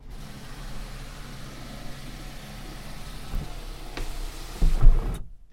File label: sun roof close